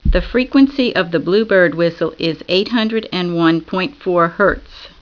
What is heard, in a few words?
freq, whistle